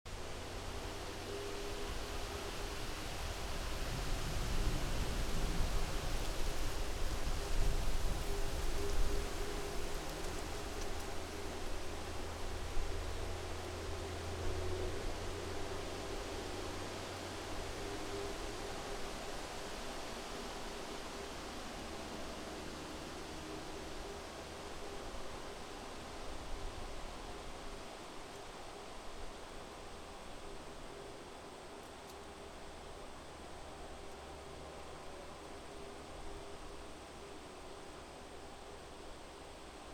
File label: Wind Out